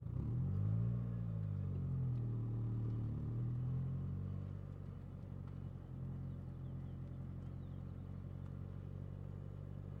Citroen C5 - ONBRD - STEADY RPMS - Drive - EXHAUST - Mix; Pole Position; Citroen C5 2002